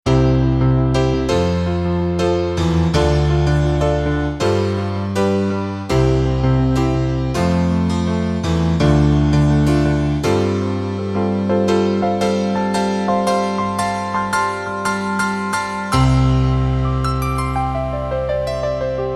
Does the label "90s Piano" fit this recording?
Yes